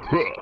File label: "ah"; BALLY; voice; CORVETTE; Corvette-Original